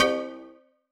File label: Attention Free Spin; netent